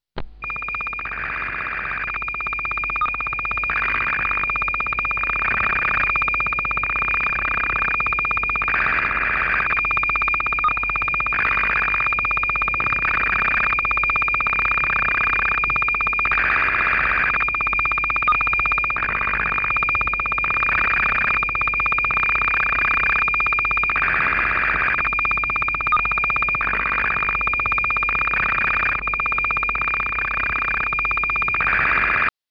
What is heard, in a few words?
SSTV Signals